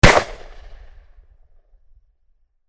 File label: unload, weapon; weapon hitman; izanagi 2022